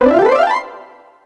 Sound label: powerup; The Wild Thornberrys Movie